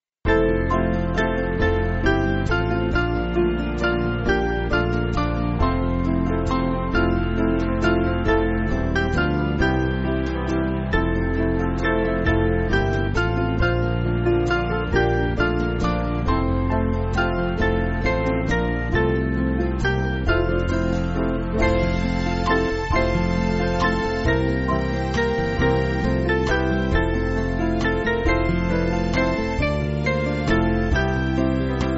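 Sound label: Band, Free Music Backing Files for Churches; Far And Near; Harvest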